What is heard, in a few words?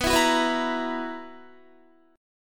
B Major 7th Suspended 4th, Guitar chord; strummed; 19,19,16,16,16,17